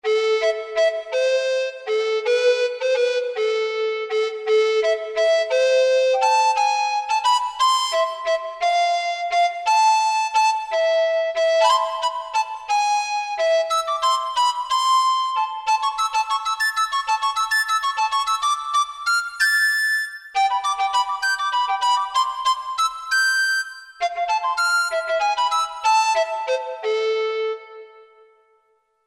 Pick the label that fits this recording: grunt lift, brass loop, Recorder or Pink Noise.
Recorder